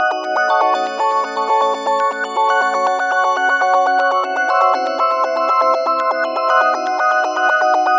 Synth Arpeggio, Synths; Eclectic Electronica

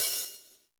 Jazz Drums, E-MU Pro-Cussion

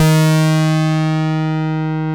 TEISCO, BASSES

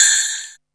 SLEIBELL FX; Back In Time Records - Big Bang

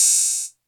Cymbals, Elektron MachineDrum SPS1 MKII

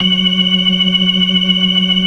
FAST VIB, ORGAN, TREMOLO VIB

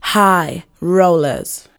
VOC, Disco Fever VOCALS

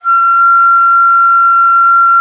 alto bon, India winds; E-MU Producer Series Vol. 7 – Old World Instruments

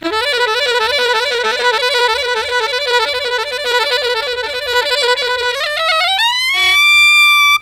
SAX, Killer Brass Riffs

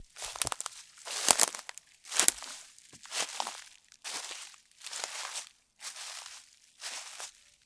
Steps Loop, outdoor, Footsteps

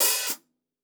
OPEN HH, HI HATS; Basement drums vol 2

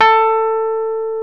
PNO, Rhodes Mk.V; Keyboards of The 60's and 70's

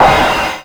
crash, trash kit, Drums Dance & Groove; USB Soundscan vol.11; AKAI

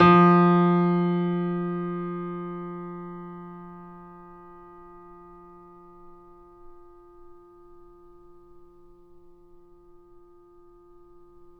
MF F2, Grand Piano; AKAI S6000 CD-ROM - Volume 2; Grand Piano 256M

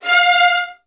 F2, VN 16TH, Virtuoso Strings; ILIO; Kirk Hunter

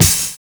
PAISTE HI; Univers Sons

